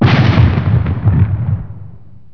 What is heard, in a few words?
kaboom